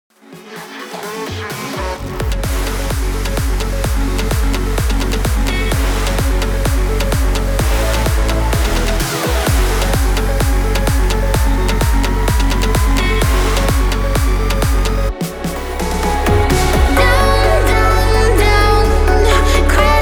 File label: 128 BPM